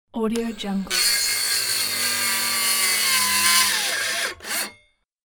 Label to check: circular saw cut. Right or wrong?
Right